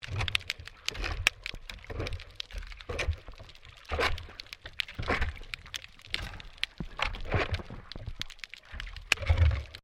MAINTAINENCE WORK UNDERWATER SHOVEL SCRAPING; Maintainence divers off StKilda Pier; Construction

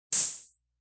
Ting, Metallic; Electronic

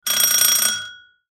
Doorbell Ring; Doorbell Ring Old; Household; Doorbells; Sound Effects:Doors

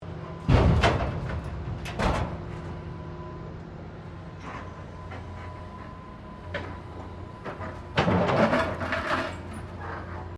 Construction mechanical claw movement; Various construction machinery

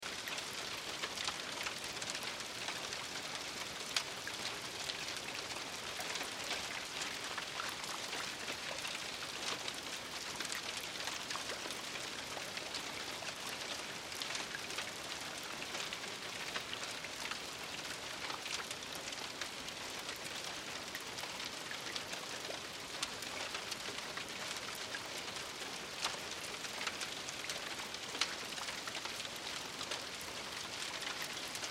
Rain House Attic Drips Horror Loop; Rain Sound Effects; Blastwave; Weather

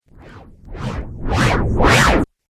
Riser Abrupt Stop Phaser; Rise And Dive

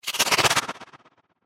Whoosh Chopper, Twirling, Object, Transition; Production Element, Imaging Element, Accent; Whoosh Sound Effects; BlastWave

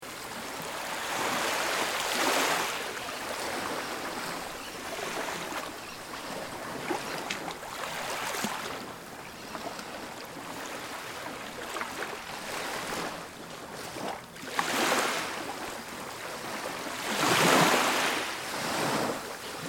Ambience Ocean Waves Near Cliff Birds; Nature

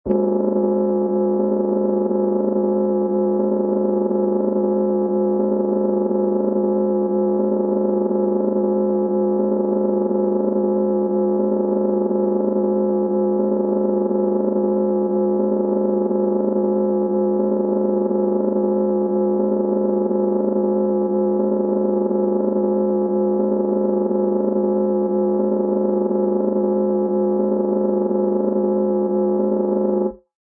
Mutants, Droning mutant sounds; Strange Tones; Sound Effects:Sound Design

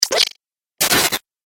Dubstep Scifi Effects

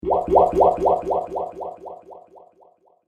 Water Bubbles With Delay; Water Sound Effects; found sounds

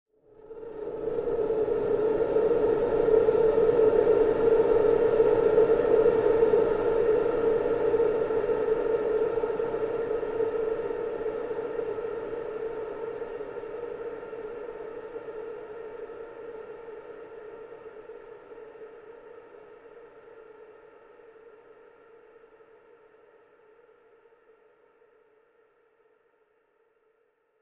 Scary Movie Trailer Transition, scary soundscape

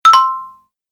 Message Notification; High Tech

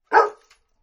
Dog Barks; Dogs; Animals; Partners In Rhyme

